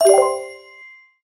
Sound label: kill; brawl stars